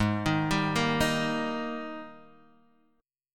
G sharp Major 7th Suspended 2nd, Guitar, Half Step Down